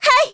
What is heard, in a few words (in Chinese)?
女人嘿哈声; renlei